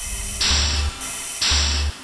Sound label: machines; poseidon